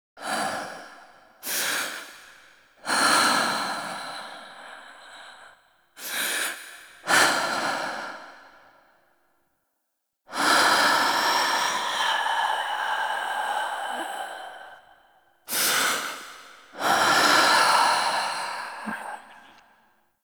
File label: Laboured Breaths